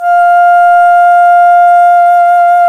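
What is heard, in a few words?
alto flute, vibrato; Roland L-CDX-03 Disk 1; A.Flt vib 1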